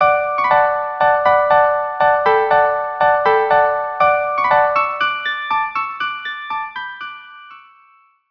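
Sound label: violin duo; Dance of Tumblers Snow Maiden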